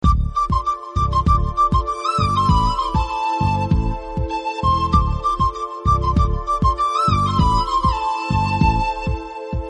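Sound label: background music Telugu Ringtone; Murari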